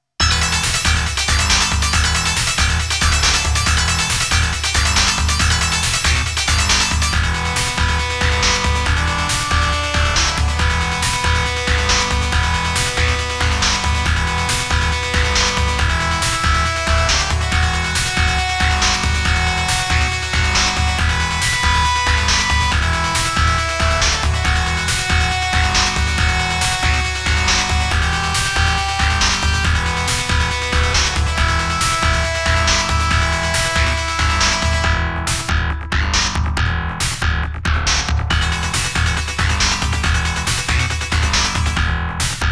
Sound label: FOREST Stage BGM; Saigo no Nindo; Ninja Spirit